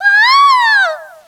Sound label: ahhhhh, Echo (inside caves, underground ect.); DS; ML BOWSERSINSIDE; Peach